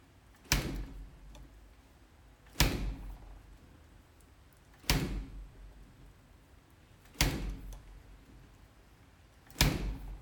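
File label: fridge door impact noise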